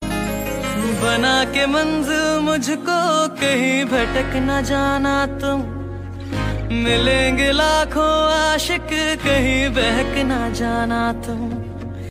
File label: Sad Ringtone